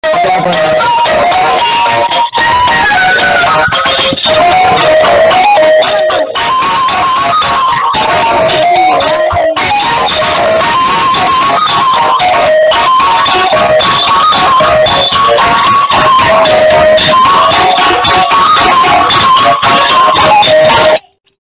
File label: Low quality (earrape)